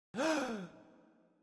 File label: Gasp